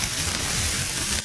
spider on bark; treetops

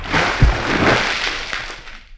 Water Step

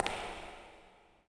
footsteps echo

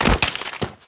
deadfall stone, foley

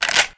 Lever Action Rifle Open; Weapons

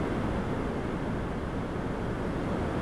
train station; prague; Soldier Of Fortune II Double Helix; XBOX; ambience

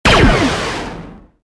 gun fire; ladygun; Star Wars Jedi Knight II Jedi Outcast; XBOX

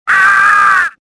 death, worker; worker2

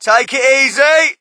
crowd, shout; Mike Tyson Heavyweight Boxing

